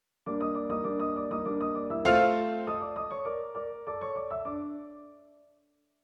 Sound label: Mineur allegretto; skizzenbuch kafka